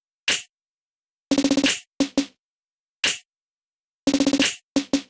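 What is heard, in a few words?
Music Maker Snare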